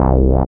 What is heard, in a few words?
SYN 202, Roland MC-202